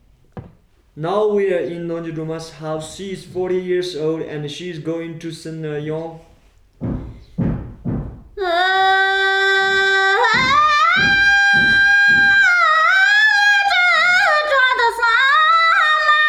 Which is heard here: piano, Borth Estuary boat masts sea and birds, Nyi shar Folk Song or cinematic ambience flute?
Nyi shar Folk Song